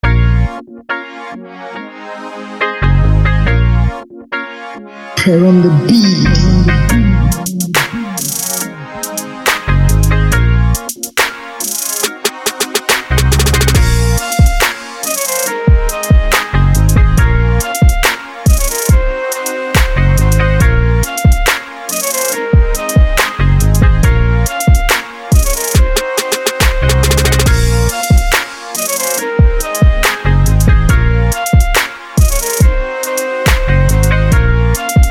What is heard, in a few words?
Flute Hiphop Type Beat